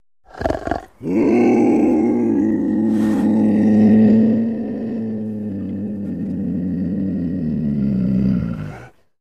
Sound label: Leopard Growl Hollow; big cats